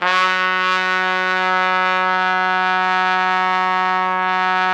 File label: F#2, Pop Brass; Best Service ProSamples vol.19; AKAI